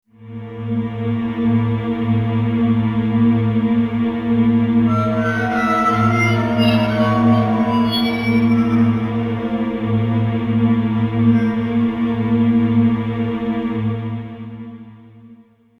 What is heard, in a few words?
Orchestral Phrase; FXHome